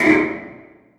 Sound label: Oneshot; Sonic Foundry (Sony Creative Software); Esoterik Beatz; Annoya